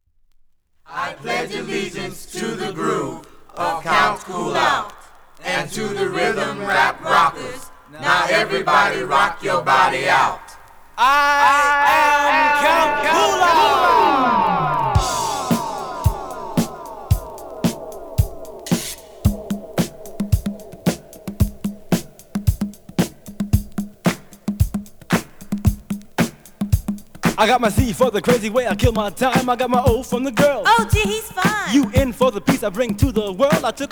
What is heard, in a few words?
rhythm rap rock